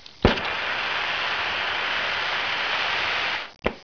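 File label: blowtorch; Image Bonanza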